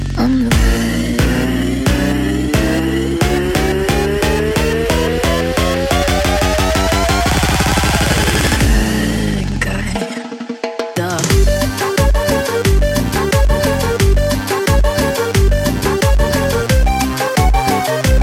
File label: Cumbia